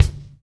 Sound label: drum; crakbone